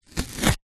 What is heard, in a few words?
household band aid plaster strip peel from skin close up